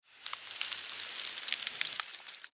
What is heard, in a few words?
Science Fiction Ice Freeze Super Power Long; Battle (Sound Effects:Science Fiction)